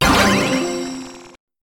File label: spell Sound; BattleBuddies